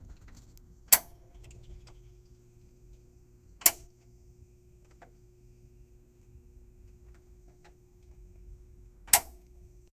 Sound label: click of lights going out